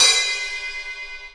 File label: UK RIDE hard; Hip Hop; STYLE KITS (Hip Hop, Etc)